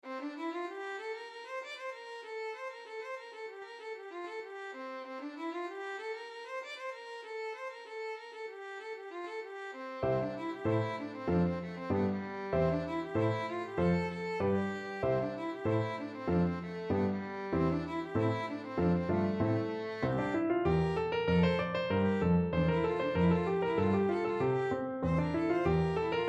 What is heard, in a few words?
Violin Sonata Modal Jig opening